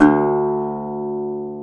GTR, Dobros, National; Club-50 - Foundations

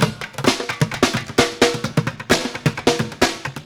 Street Groove, Ambient Grooves

